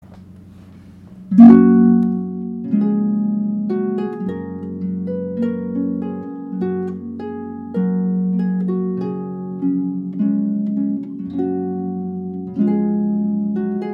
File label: Trumpet Voluntary